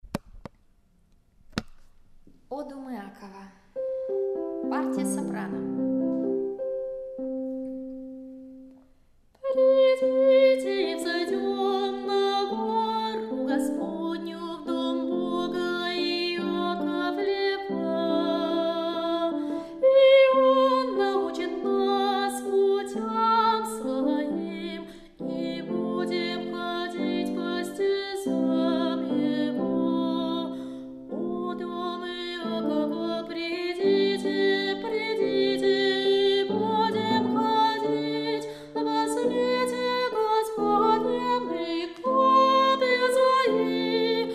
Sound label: soprano; epiphany; O dom Iakova